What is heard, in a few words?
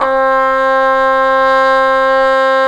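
brass cup mute trumpet, dry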